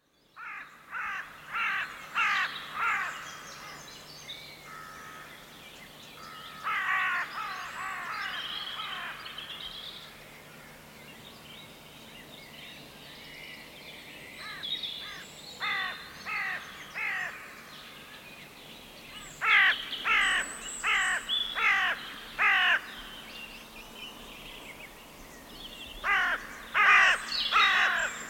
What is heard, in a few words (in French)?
corneilles